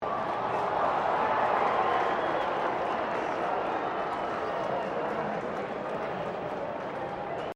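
crowd 20K Melbourne Cricket Ground cheer low, Cricket at Melbourne Cricket Ground; Crowds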